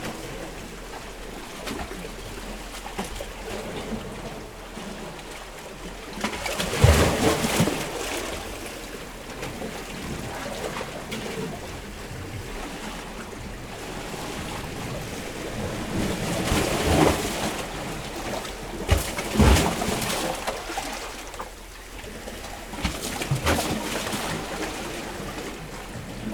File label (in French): mer trou rochers; L'iliade